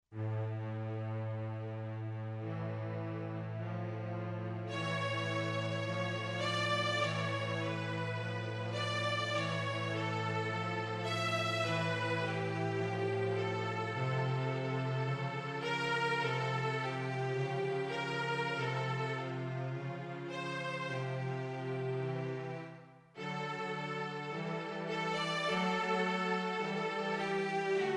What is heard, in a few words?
meditation, piano